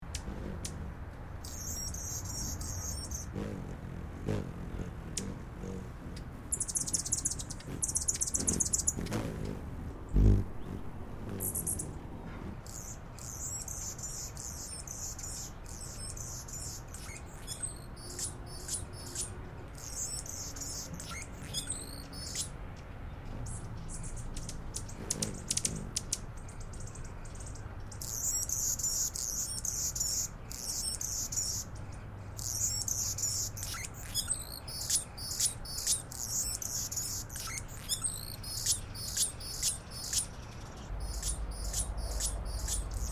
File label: annas hummingbird